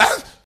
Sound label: "arf"; ooh ahh; human